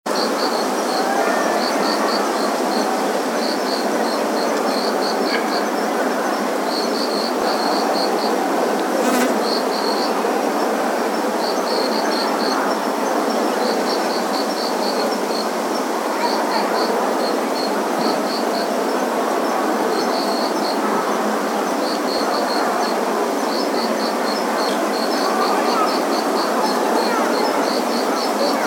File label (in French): ambiance yandangshan